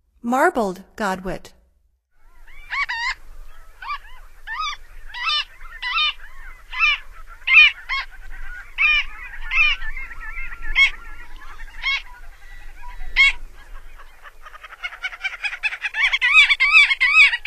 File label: Marbled Godwit, Songs of Birds of North America; Stokes